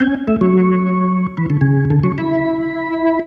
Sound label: Organ Lick; Ambient Grooves; Organs Licks